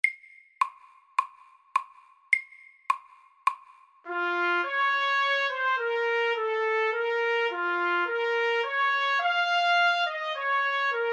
Etyde i C moll MIDDELS

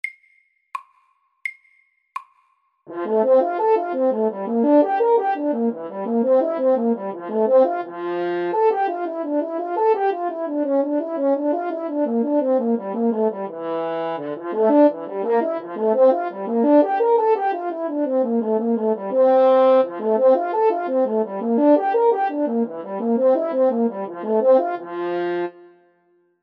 Akkord etyde FORT

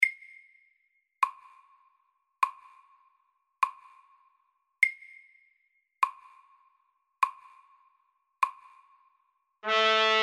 Duett i H-moll

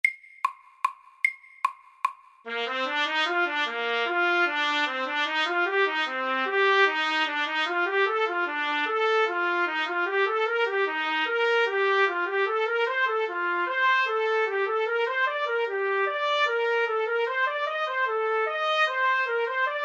Etyde MIDDELS

